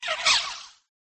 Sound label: cry